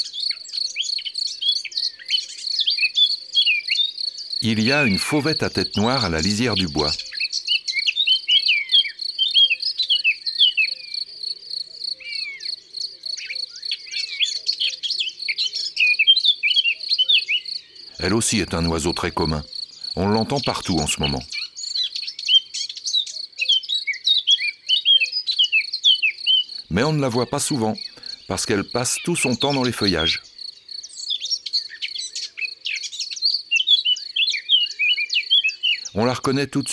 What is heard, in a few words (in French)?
Fauvette a tete noire; abc de la nature